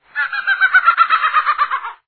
acaua

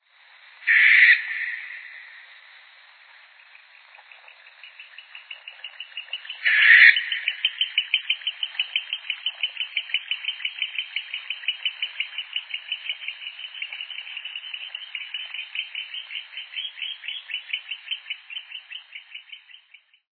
suindara

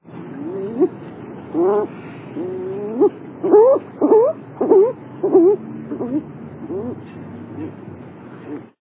garca-real

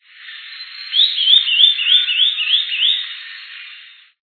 policia do mato

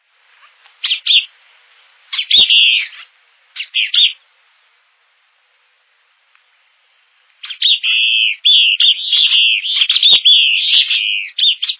maria e dia

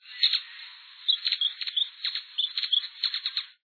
tesourao

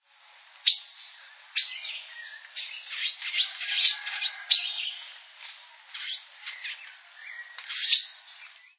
pipira vermelha

